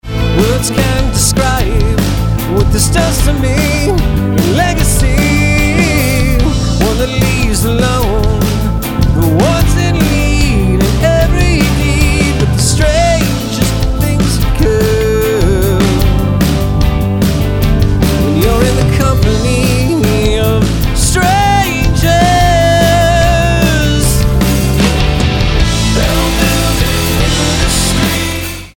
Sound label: ALL MIX